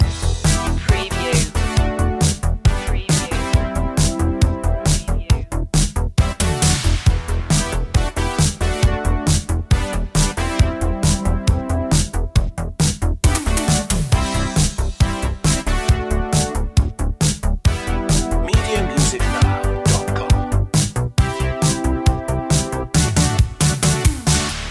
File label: laser ninja loop